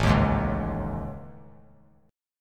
A Major 7th Flat 5th, down; AM7b5 strummed; JGuitar

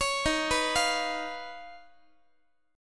D sharp 7th Suspended 2nd Sharp 5th, Guitar; arpeggiated; JGuitar

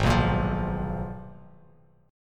G sharp Minor 6th Add 9th, Bass Standard; G#m6add9 strummed; 4,2,8,10; JGuitar

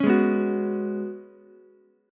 Gb Suspended 2nd Suspended 4th down Ukulele; Gbsus2sus4 strummed

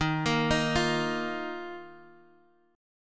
Eb Suspended 2nd, Guitar, Open G

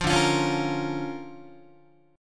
D Minor Major 7th Sharp 5th D Guitar; 8,10,8,11,9,9; DmM7#5 strummed

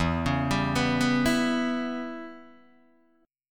E Minor 6th guitar chord, Open D

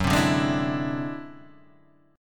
D 9th Flat 5th F sharp

